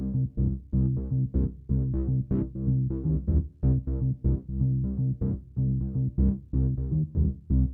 124BPM DRIVE BASS C; Bass Loops

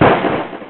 gunshot; Amiga Soundtracker Sample Packs

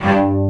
Spicato Basses & Celli

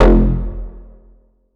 bass tweak spaced F; Polyend; ARC Noise

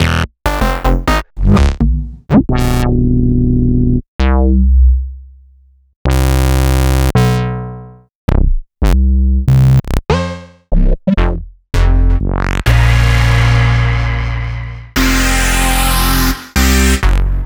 Synth Basses; Sliced

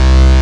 JUP8 PULSE; E-MU Formula 4000 Series Vol. 2

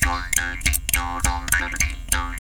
JAW GROOVES, Didgeridoo

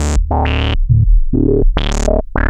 HVCF D1; CMP - Analogon; SH-VCF

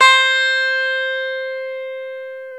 GTR E12, Dual Guitar; Club-50 - Foundations Roland

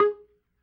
G#2 PERC, B3

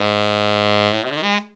TENOR FX, Horns; Zero-G; Phantom Horns

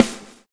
swing

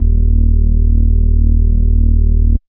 J Bass, Bass One-Shots, Drum n Bass; KJ Sawka - Drum n Bass & Beyond

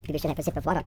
YODEL YELL, World Voices; ILIO; Vocal Planet VOL-5

